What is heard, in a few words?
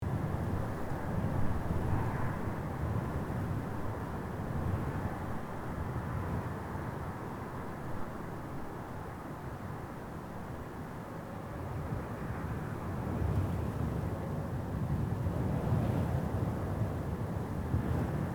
Quiet desert night ambience loop